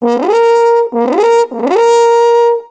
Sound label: horn fx; Musical Instruments